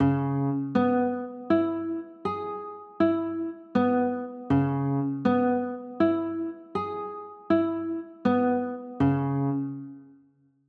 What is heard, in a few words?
c sharp m7